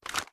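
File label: assault rifle reload fast start; viper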